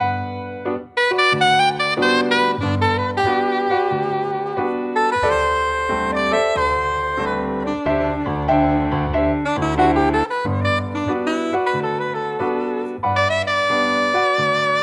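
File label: Jazz trio, Relaxing cafe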